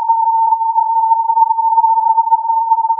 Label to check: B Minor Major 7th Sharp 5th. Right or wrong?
Wrong